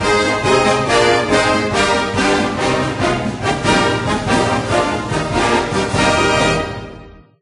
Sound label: Short Song; ncaa gamebreaker 2000; ps1